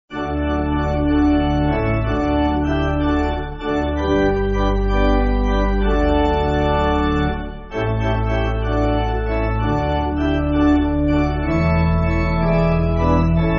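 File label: Pipe Organ, church music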